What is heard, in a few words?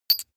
medium metal impact medium scrunch